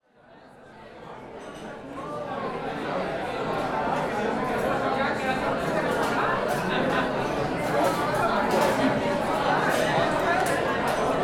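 Restaurant Dinner Interior Walla Crowd 50-75 People Germany; Zoom H4; West Wolf; Restaurant Ambiences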